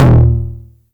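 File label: 909 low tom, tape saturation; Toms; Goldbaby Tape808 & Tape909; The Tape 909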